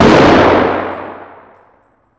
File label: weapons